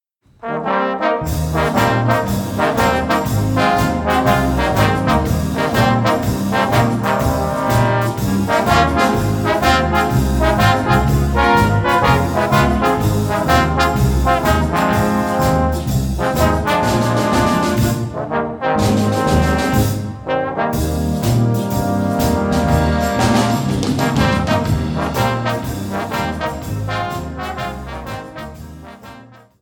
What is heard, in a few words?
magic trombones